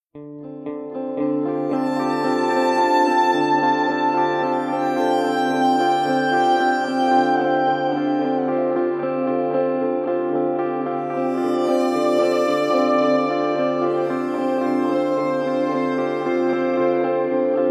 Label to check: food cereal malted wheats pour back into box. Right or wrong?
Wrong